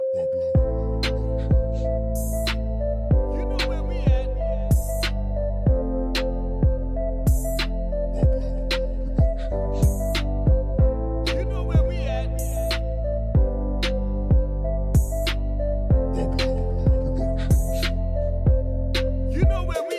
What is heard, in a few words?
Reggae Dancehall